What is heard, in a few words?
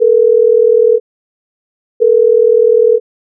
Call Outgoing